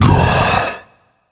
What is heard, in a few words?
sigh; fenrir